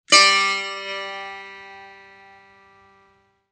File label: setar re